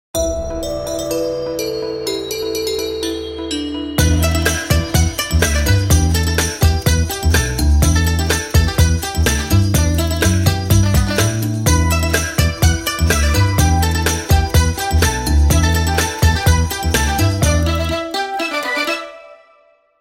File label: Sumatra Ring, Nokia Tones; Windows Phone apps